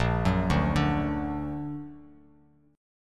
A sharp Augmented, Bass Standard; A#+ arpeggiated; JGuitar

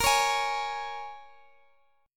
Bb Minor 7th, Guitar, Open D

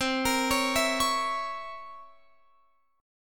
C 7th Flat 9th C Guitar Open F; C7b9 arpeggiated; x,19,22,20,19,20

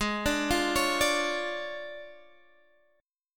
D Minor Major 7th Flat 5th, G sharp

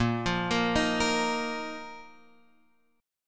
Bb Suspended 4th Bb down Guitar Open F; Bbsus4 arpeggiated

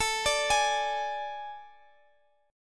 A Major 7th Suspended 4th, Guitar, Open F; AM7sus4 arpeggiated; x,x,x,16,17,15

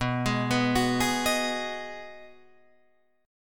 B 6th B down Guitar Drop D

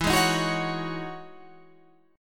E Major 13th chord

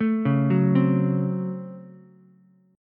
Db Major 7th Suspended 4th Sharp 5th Db Ukulele; 2,1,2,3; DbM7sus4#5 arpeggiated; JGuitar

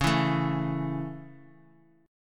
Db Minor Sharp 5th Db; strummed; Dbm#5; JGuitar

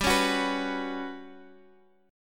G Major 7th Flat 5th G, Guitar Open F; GM7b5 strummed; x,x,7,8,9,6

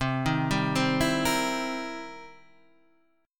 C Minor 7th Flat 11th, chord; Cm7b11 Chord {8 6 5 5 5 6} arpeggiated

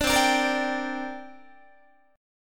C 7th Suspended 2nd C down Guitar; C7sus2 strummed; JGuitar

